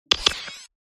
Transmition glitch; PMSFX

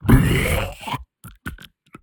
Screams Shouts Monsters Monster Pain Death; Screams Shouts 2; soundbits

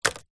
technology headphones set down wood surface

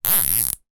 industrial cable tie fasten single

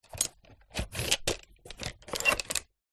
sport body builder belt undo

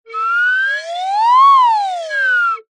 cartoon slide whistle double pitch up down

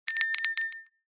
bell small ring close clean shop door bell

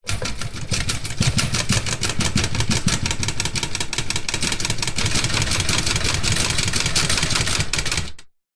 office metal wire tray drawer shake

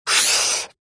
office pen felt marker single stroke on paper

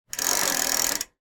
transport bicycle back pedal

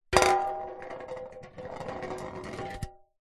foley tin metal cylinder lid on roll on concrete; zapsplat

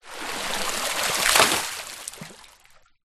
nature water movement surface splashes deep; zapsplat

